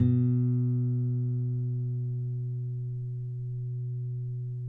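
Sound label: nylon, FLAMENCO, Guitars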